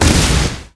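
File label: attack explosion, spider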